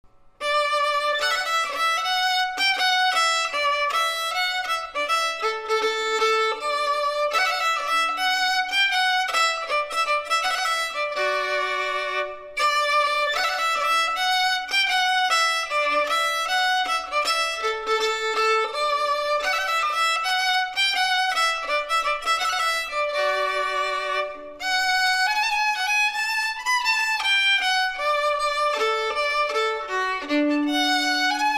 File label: Trumpet Voluntary, violinist